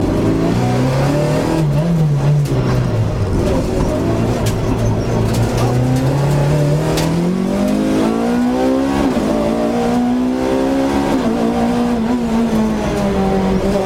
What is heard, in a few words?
Rally car race loop; beanstalk audio